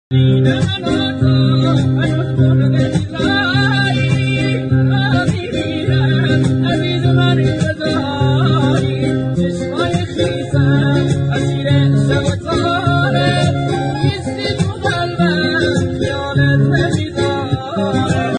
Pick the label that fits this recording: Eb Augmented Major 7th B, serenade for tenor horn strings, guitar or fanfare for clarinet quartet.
guitar